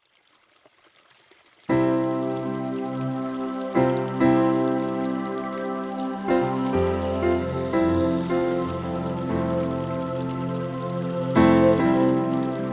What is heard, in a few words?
Djembe; God of Rain